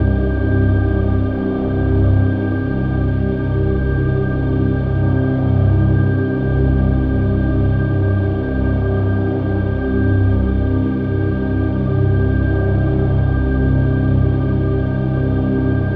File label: bg neon alleyway C minor; fx; base pack